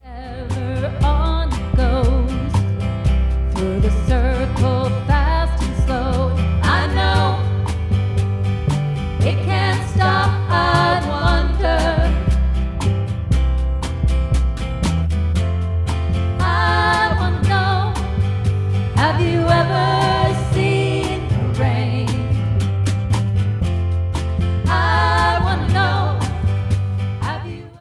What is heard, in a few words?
acoustic snippet